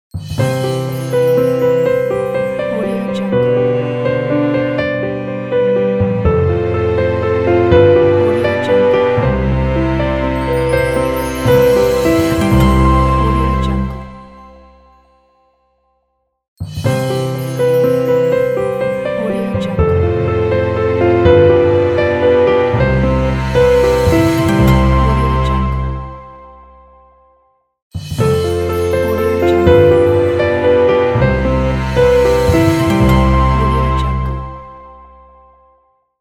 documentary intro logo

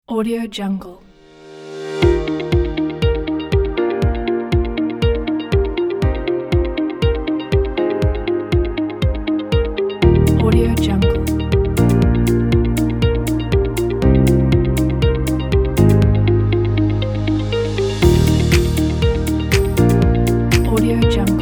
peaceful upbeat corporate background

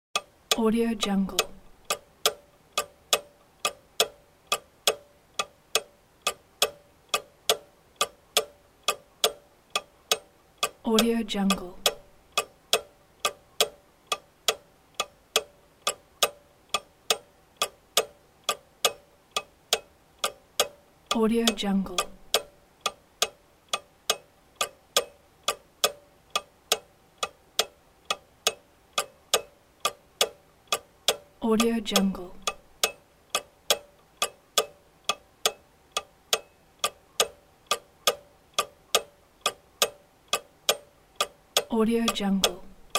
clock ticking

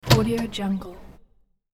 wooden door slight squeak accent; hollywoodedge

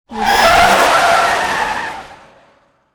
whoosh of car tires

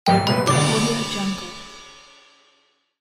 victory bonus

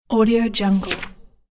turning a page of a book

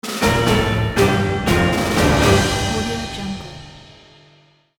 dramatic orchestral breaking news jingle

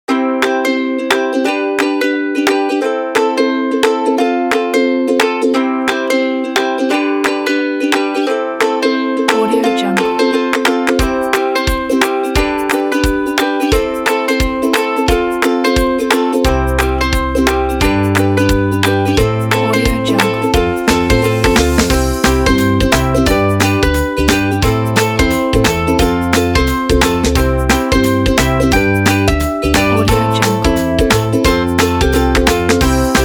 happy acoustic upbeat

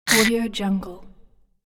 short pain critter monster voice

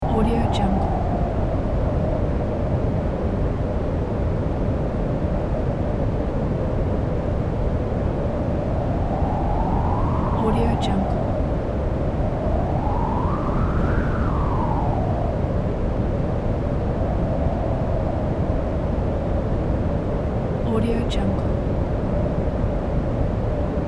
lake wind loop; magicsoundeffects

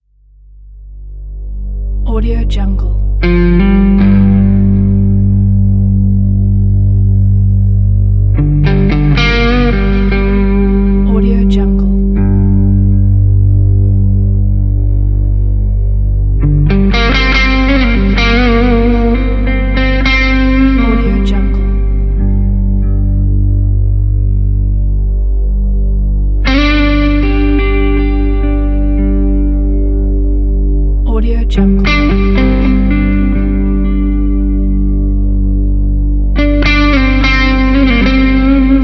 blues western guitar

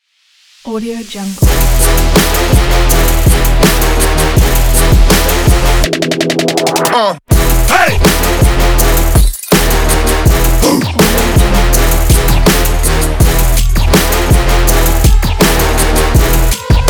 aggressive sport trap

